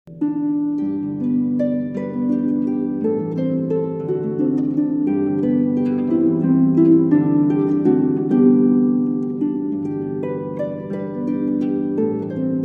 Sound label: Traditional Welsh Airs